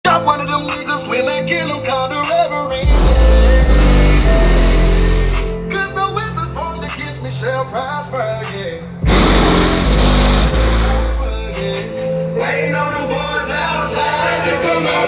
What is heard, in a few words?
bass boosted ride